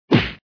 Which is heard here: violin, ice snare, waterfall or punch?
punch